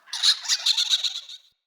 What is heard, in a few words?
Squirrel Freed Call; Encounters; Animal Rescue